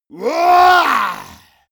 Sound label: monster growl attack throaty; schreie